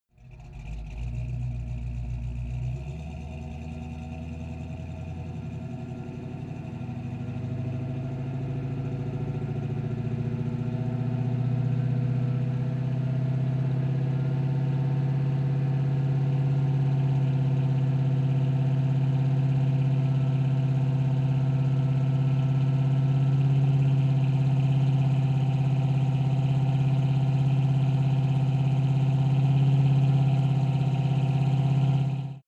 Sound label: car camaro accelerate heavy exhaust